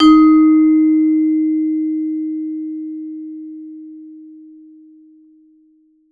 vibe med ff D#3, Marimba & Vibes; Sampleheads; Dave Samuels

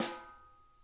SNARE EDG; Sonic Reality; Interactive Drum Kits

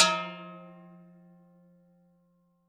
low bell; Univers Sons - Raricussions

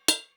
crash, Millenium MPS-400

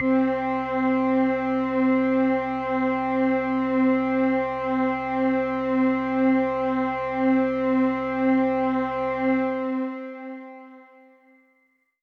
Pad C, Synth Prophet One Shots; Electronisounds

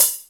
Cracked Hat; Digital Sound Factory Xtreme Lead

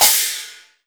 CH PERC, MISC PERC; USB Soundscan vol.60; Asian Traditions; AKAI

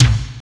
base drum; DRUMS

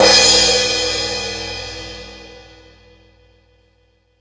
High Hat, Drum Machines E-MU Pro-Cussion

